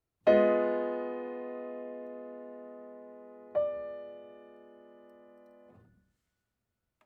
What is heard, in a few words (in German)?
Träume Akkorde